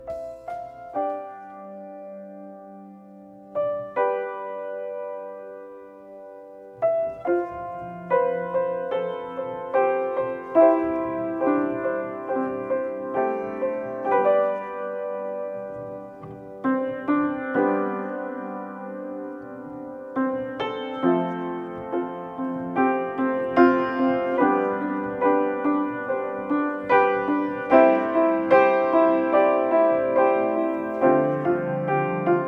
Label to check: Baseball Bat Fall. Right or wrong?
Wrong